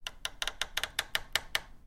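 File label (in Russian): маленькая деревянная дверь открывается и закрывается с некоторыми вариациями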